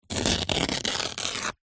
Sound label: paper towel kitchen roll rip; zapsplat; foley